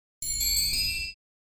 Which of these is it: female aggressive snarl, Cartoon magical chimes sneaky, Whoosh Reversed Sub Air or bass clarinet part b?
Cartoon magical chimes sneaky